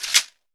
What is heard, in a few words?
cabasa; Brazil